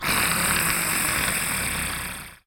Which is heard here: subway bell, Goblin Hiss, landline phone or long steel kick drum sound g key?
Goblin Hiss